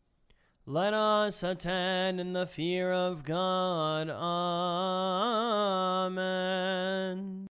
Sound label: let us attend in the fear of god, hymns